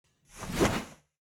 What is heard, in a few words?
wizard apparation sound effect; soundscrate